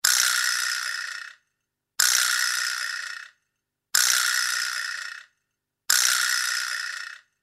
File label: vibraslap; lateinamerika